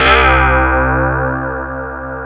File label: DS8 C 2, Korg; Back In Time Records; Korg Universe VOL-1